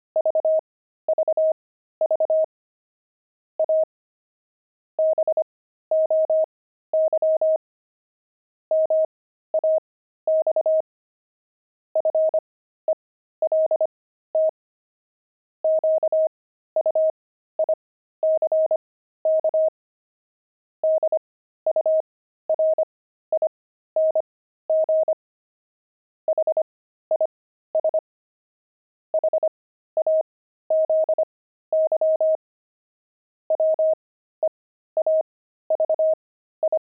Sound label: pangram, intermediate pangrams files; weaving